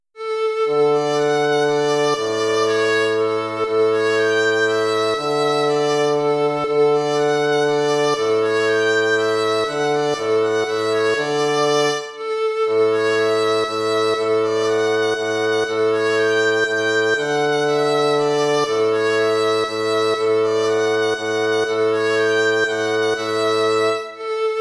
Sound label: waltz; Carulli Ferdinando